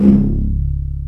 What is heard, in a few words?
RY-10, DRUMS + PERC, YAMAHA